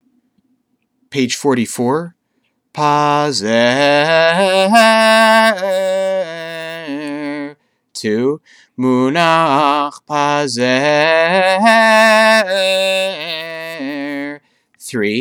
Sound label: Pazer